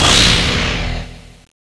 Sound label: charge finish; cso; runebreaker